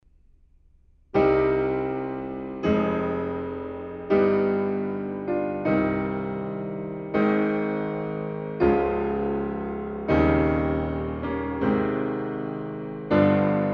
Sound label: Prelude no. 20 in C minor; Chopin; IMSLP